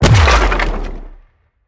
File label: impact; smite; cabrakan